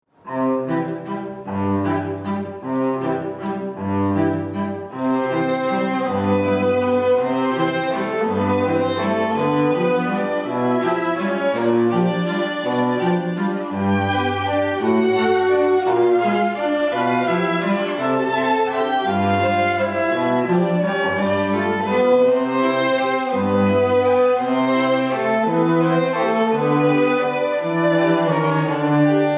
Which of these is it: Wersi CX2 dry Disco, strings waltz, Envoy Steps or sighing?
strings waltz